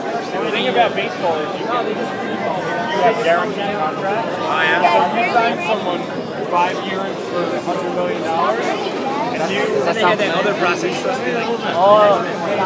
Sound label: the sounds of waiting for shake at citi field